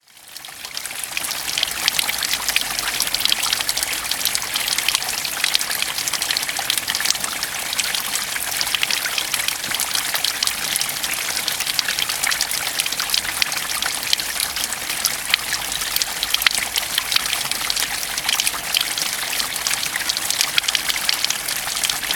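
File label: spring; rodnik olen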